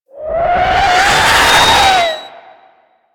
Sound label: Artillery Whizzby; Enemies; Abilities; Teralyst